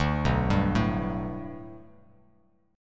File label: B Minor 7th Sharp 5th B Bass Standard, chord; Bm7#5 arpeggiated; JGuitar